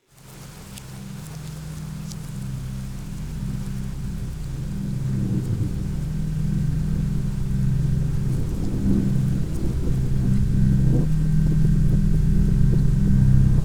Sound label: dark rain organ background; sound galaxy vr universal fx; nova sound